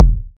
clear kick sound f key, Loudest frequency: 208Hz

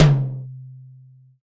huge tom one shot d key

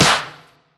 short reverb clap D key

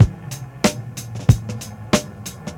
93 bpm drum loop g sharp key; drum loops

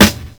00s natural snare drum sample g sharp key

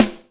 raw snare sample g sharp key; snare drum one shots

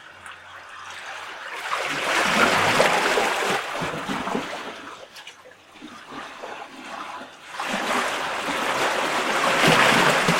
swell inside a hole in the ice; water-drop

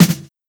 snare sound f key

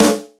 Clear Snare Single Hit D Key, steel snare drum

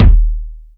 wet kick drum single shot D# key

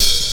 dampened open hat e key

